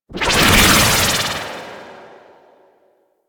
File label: Decoy Cast; Warframe; Loki